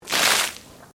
Water Splash Concrete Medium

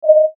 Beep Soft Buzz Tone; Production Element Imaging Element Accent Transition; Multimedia

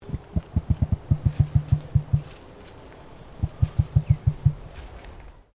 Bird Emu Queensland Throat Noise; Birds in Queensland; Animals

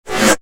Psycho Shrill Dentist Drill; Evil Sound Effects; horror sound effect

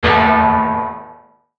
METALLIC HIT, Metallic Hit Flange Bright Reverb; Production Element Imaging Element Accent Transition; Metallic Sound; Metallic Sound Sound Effects

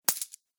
Gold Coins Drop; Video Game

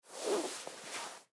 Tennis Ball In, Tennis Ball Place In Pocket; SPORTS; SoundEffects-Blastwave

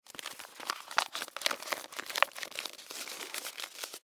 Foley Newspaper Roll Up; Paper (Sound Effects:Offices)

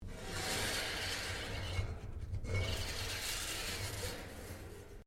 METAL TAPE MOVE; Sound Effects:Materials; Foundation3

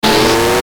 static buzz; Static Buzz Ascend Power Up; Production Element Imaging Element Accent Transition; Blastwave

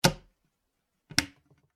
household cupboard door open close; Small Cupboard Door Open Then Close; Cupboard Door Open And Close; Cabinet Doors; McKinneySound

